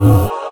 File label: heal wpn